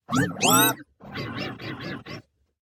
commanding beeps; 守望先锋2; 堡垒; Overwatch

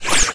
reload

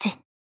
Siege tap

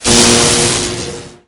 lightning

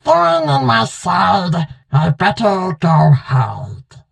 hurt; cordelius

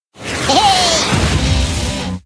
Rocket Goblin Ride

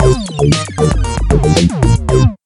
Emote Dance

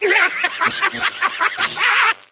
Emote Laugh

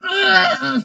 hurt vo; 卡通工作室

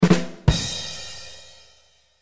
ba-dum-tss

